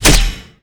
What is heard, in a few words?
Mace Shell, Damage